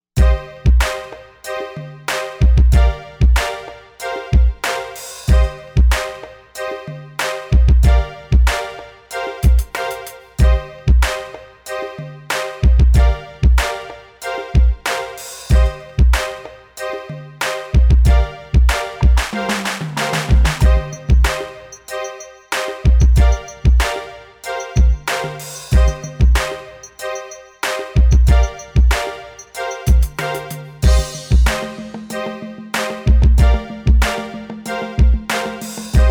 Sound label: STOMP, AKAI XR20 Factory patterns